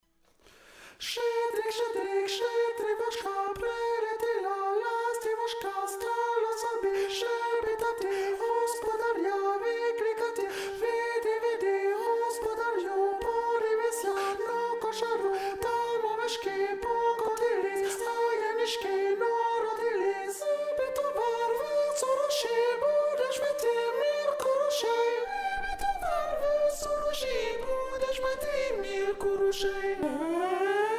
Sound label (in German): sopran; shchedryk